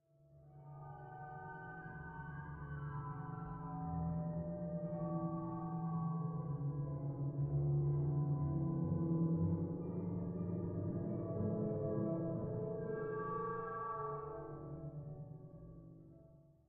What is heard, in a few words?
Nutcracker Vent Crawl; lethal company